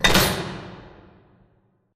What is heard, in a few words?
Dome Wall Close Screen Start; Titanfall 2; Boomtown